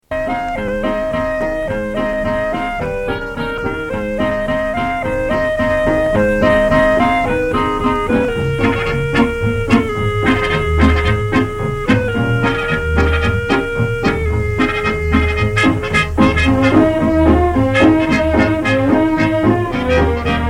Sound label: OrganGrinder